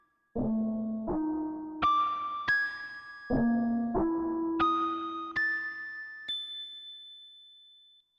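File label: Gtr Harmonics, digital piano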